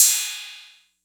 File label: Cymbal 808; gate experiments